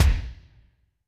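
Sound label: Kick Lo Techno Room, Alesis D4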